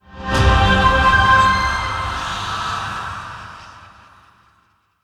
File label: large win; netent